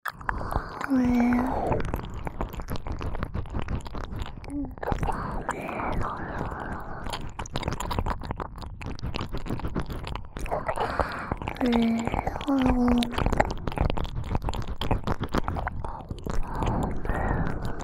啾啵啾啵雙重舔耳; 附錄迴圈式音軌（自慰輔助）; 耳邊迴圈; 由紀＆華戀

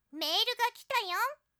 新郵件通知

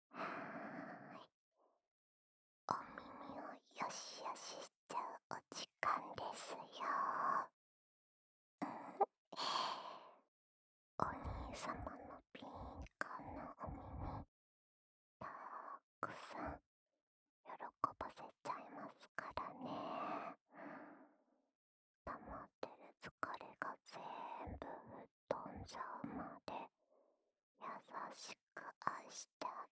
附錄01 掏耳／右, ASMR

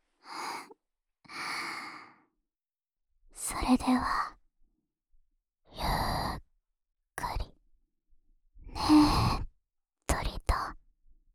悠閒地舔耳 右

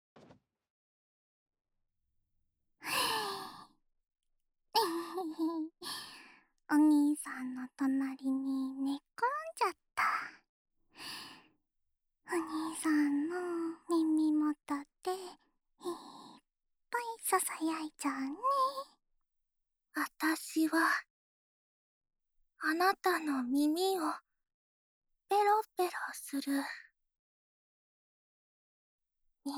露琪娅的舔耳，夏洛特的甜蜜低语（左右）

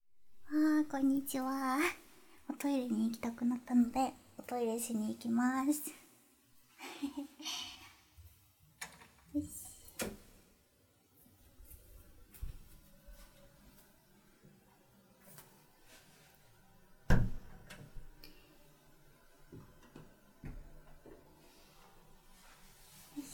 正常尿尿 降噪版

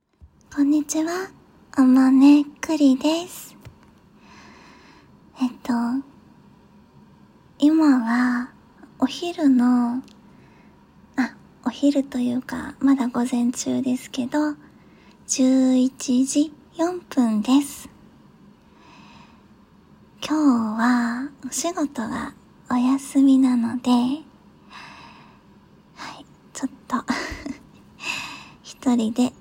口交音; 降噪版

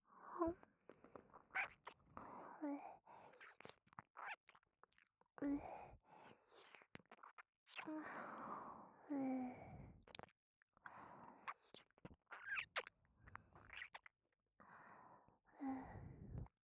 舔耳 弱

